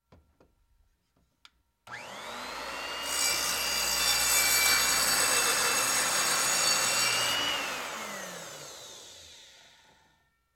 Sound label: Elect Circular Saw On